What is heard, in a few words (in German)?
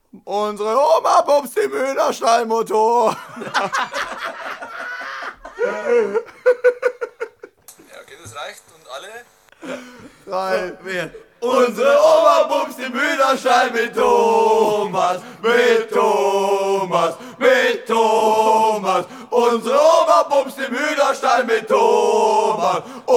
Motorrad